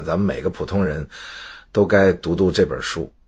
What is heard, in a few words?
guns audio segments